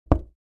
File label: Knocking on a wooden surface or door